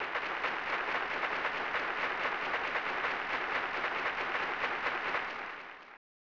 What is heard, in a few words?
Applause; Family Feud; SNES